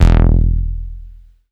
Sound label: Bass Juno E; Vengeance Samples